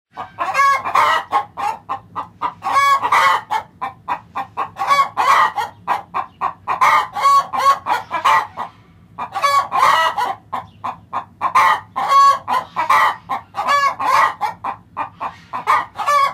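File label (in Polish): odgłos zwierząt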